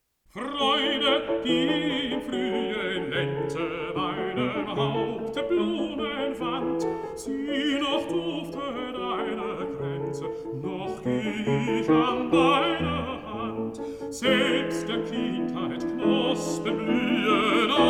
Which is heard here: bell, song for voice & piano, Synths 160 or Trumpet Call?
song for voice & piano